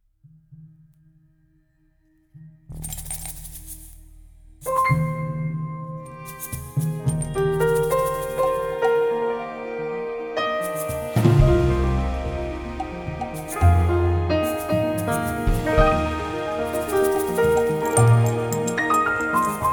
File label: drum Mix